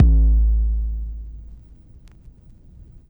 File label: Vinyl Kick, drum